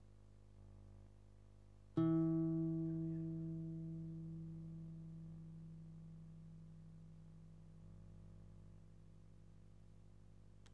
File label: guitar